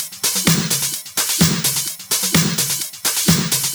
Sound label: House loops 128BPM; Vengeance Samples